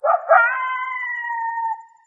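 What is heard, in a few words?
rural random coyote; random amb sfx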